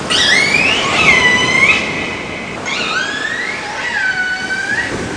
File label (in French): baleine